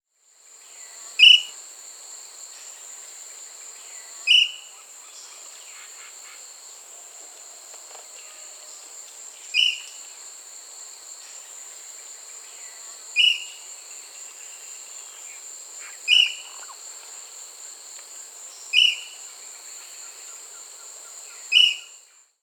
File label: Short-crested Flycatcher (Myiarchus ferox), Peru, Birds of the Amazon; S. America